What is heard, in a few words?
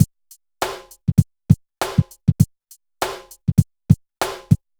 BPM100 SYN; AKAI S-Series CD-ROM Sound Library VOL-4